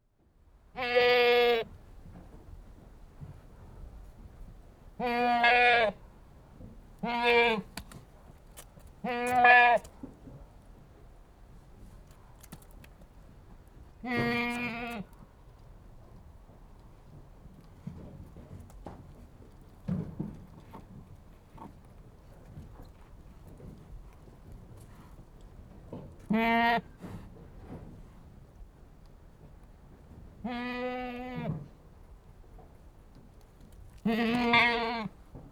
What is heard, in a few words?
One Anglo-Nubian Goat Bleating; Livestock; BBC Sound Effects Library